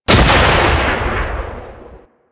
Mortar Fire B, Rocket Swarm Launcher; Warframe; Ambience; Eidolon Plains; Grineer Emplacements

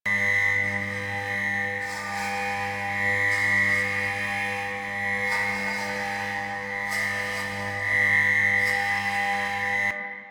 Light Glow Loop; Warframe; Duviri

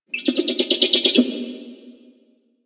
Owl Critters Perched Ground; Ambience; Duviri; Warframe

